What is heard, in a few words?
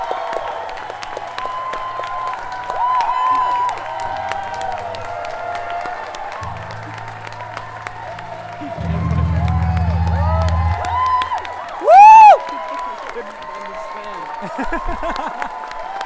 encore Noise